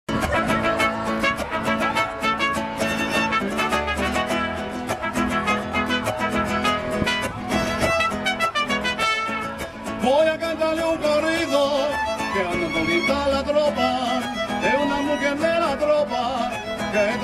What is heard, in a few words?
Mariachi playing La Cucaracha at Festival; Mariachi Tequila; Hyde Park Festival